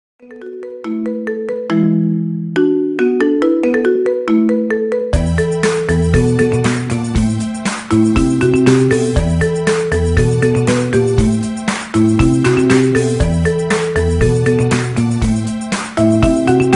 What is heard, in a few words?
marimba ringtone remix